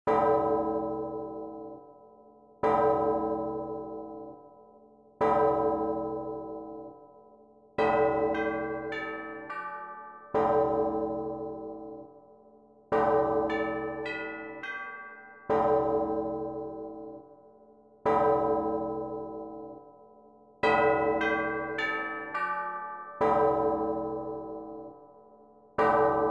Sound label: Big Ben